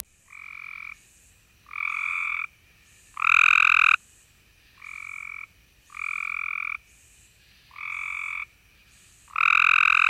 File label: Copes Grey Tree Frog Hyla Chrysoscelis